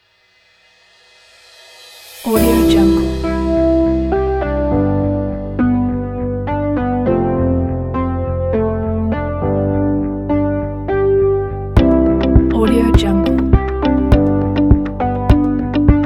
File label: upbeat tech music; elijah-studio